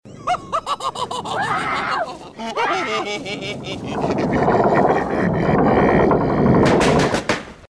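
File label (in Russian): смех с криком ребенка; penniwaisa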